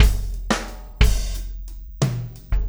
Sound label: Basic Beat